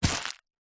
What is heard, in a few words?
plastic packet cellophane containing small object grab pick up